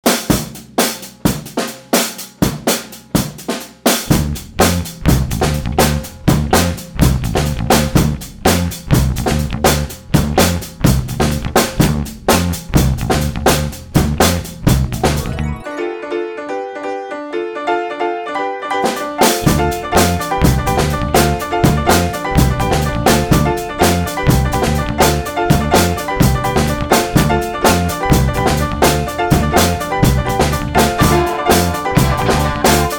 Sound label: Instrumental